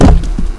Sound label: high wall place wood